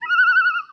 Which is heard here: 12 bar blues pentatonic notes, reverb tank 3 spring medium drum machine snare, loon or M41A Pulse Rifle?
loon